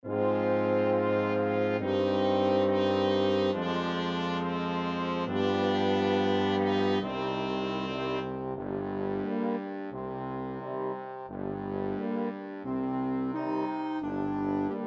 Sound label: orch